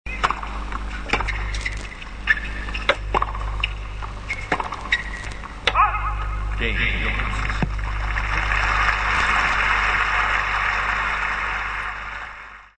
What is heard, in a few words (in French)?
Ambiance tennis salle; bruitage